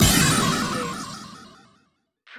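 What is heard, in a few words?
Production Element Hit Sweeper; Sound Effects Bible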